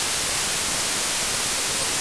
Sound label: white